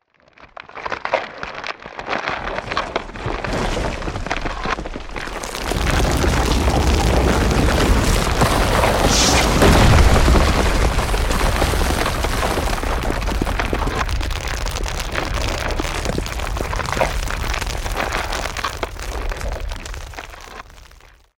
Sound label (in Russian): treski i osypi